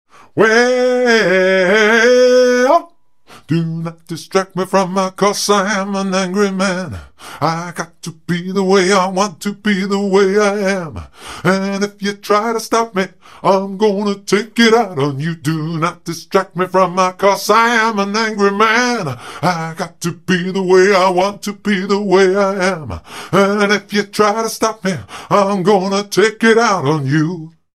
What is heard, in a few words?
Vocal pitched -3